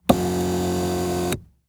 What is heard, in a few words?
Transportation Jeep Commander Adjust Seat